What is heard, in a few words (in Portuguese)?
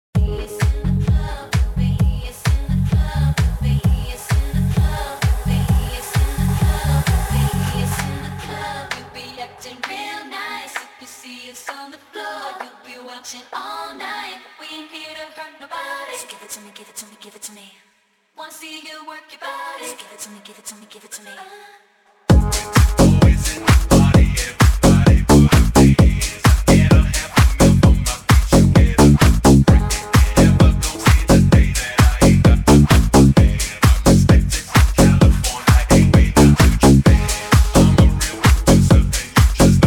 pancadao funk